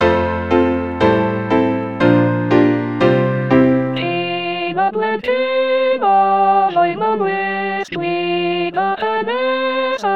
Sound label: Alto